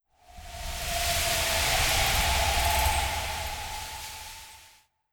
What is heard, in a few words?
Dis Pollen